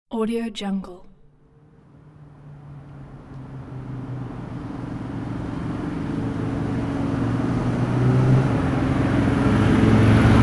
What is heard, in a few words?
motorcycle riders in traffic